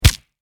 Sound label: Impact Punch Face Hard Crunch; Punches and Hits; Combat